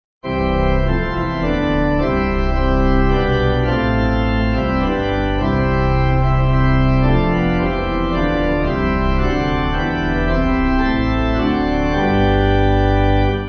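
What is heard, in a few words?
Pipe, Pipe Organ backing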